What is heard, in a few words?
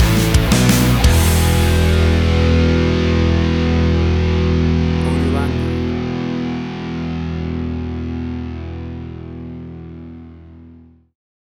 Big Heavy Metal Orchestral Fusion Stinger; AUDIOLANDER